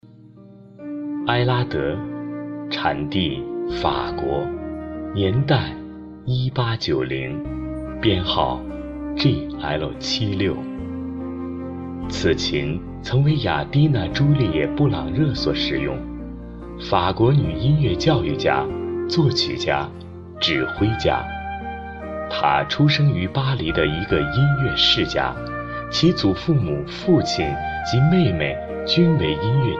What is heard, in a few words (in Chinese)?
钢琴